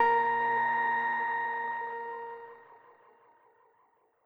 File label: chime; chime sharp